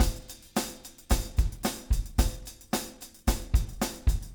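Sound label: 110 4 4 snare beat tam; Big Fish Audio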